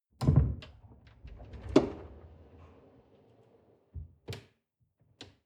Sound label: folding door open long